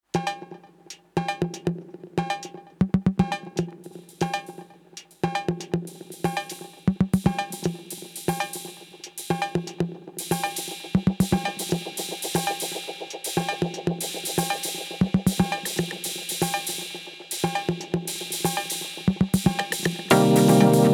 ARP

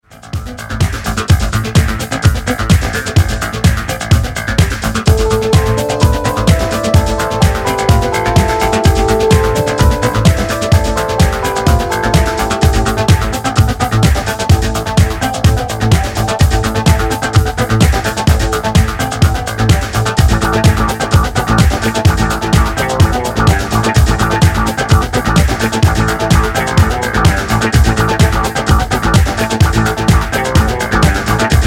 mystic strings

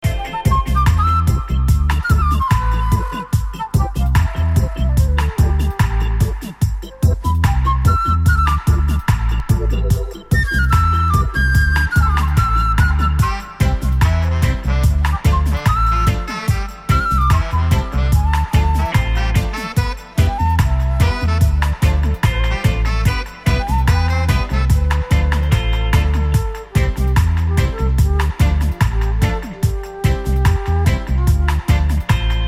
Need A Roof (flute)

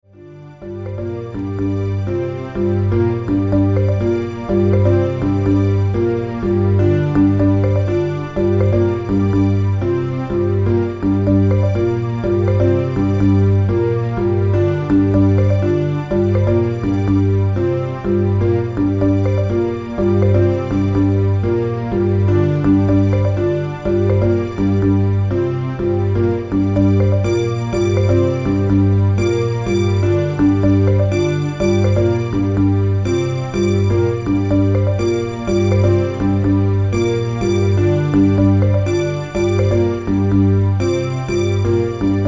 strings mix

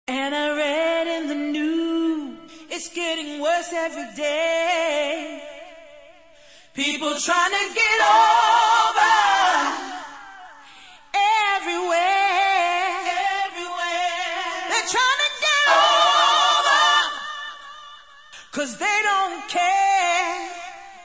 acapella

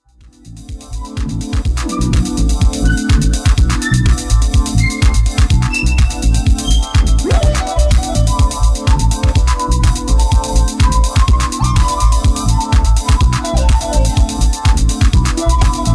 whistle

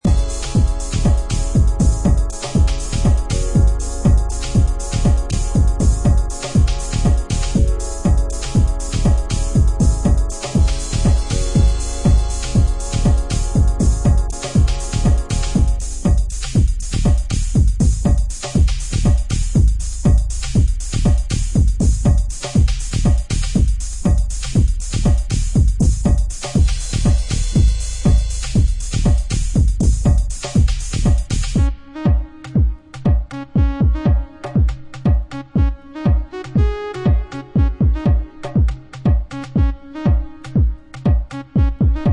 sax on the beach

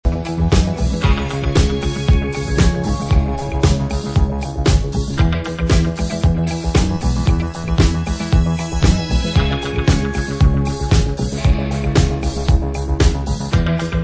instrumental

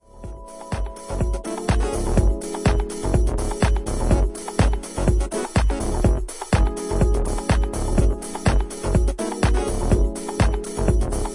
Instrumental

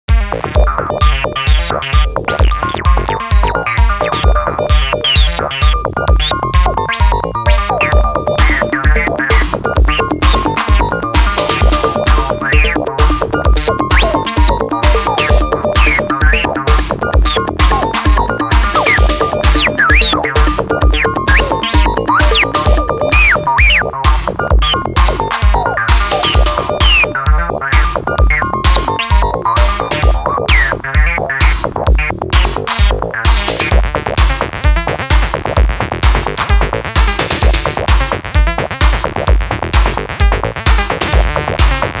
techno music; Metroplex

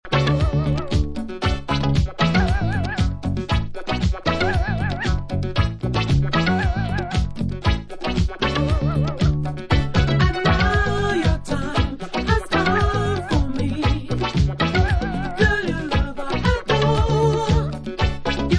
vocal; Disco

